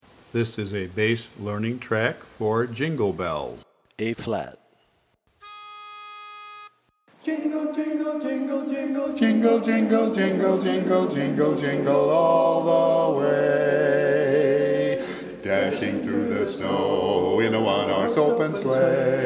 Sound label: Jingle Bells Bass